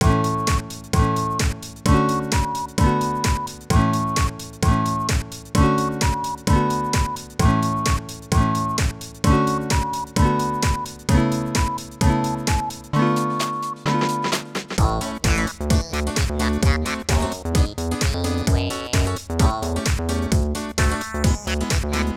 Chorale House Remix

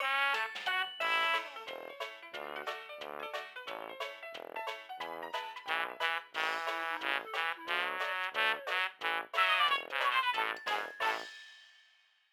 Radio Jingle; Turkey Day

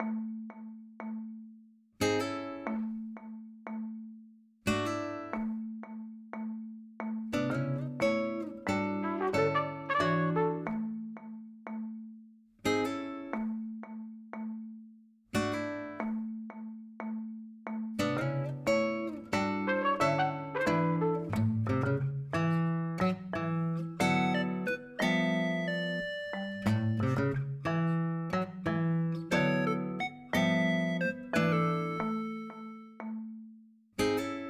10 AM (Rainy)

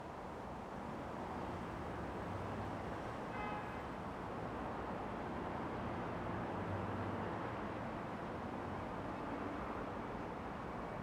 City Soundscape

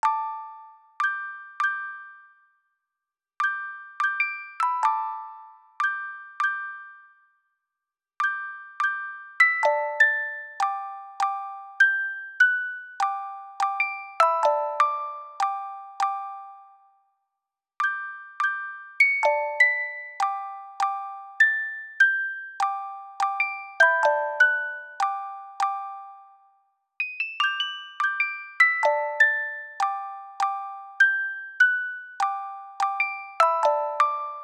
Music Box

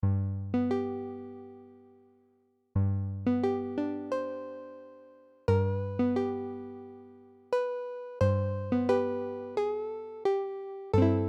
Slack-Key, Aircheck, Phono

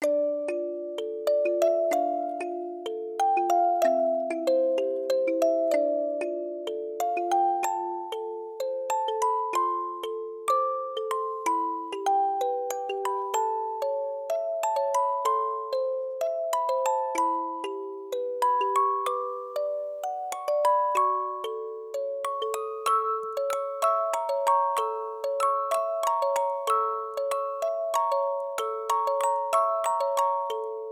Music Box

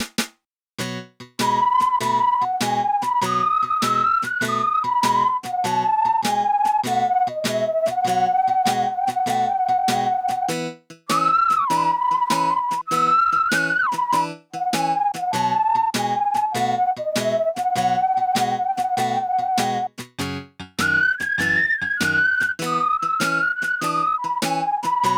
Blues (Aircheck, Retro)

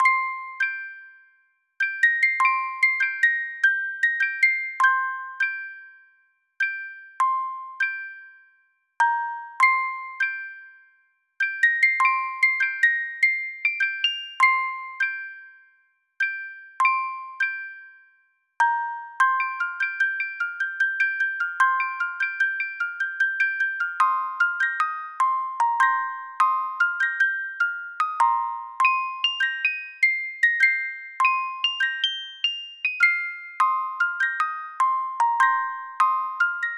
Music Box

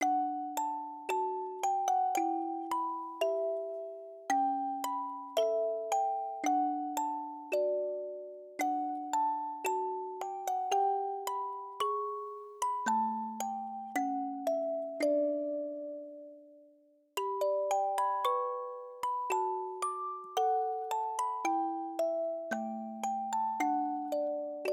Polka Music Box

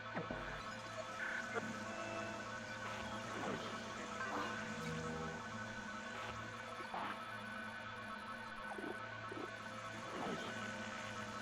Cyber Soundscape

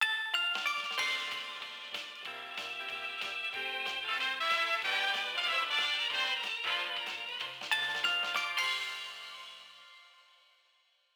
Radio Jingle (New Year's)

